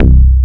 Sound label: DISCO BASS; SAMPLE WAVE MIXING 1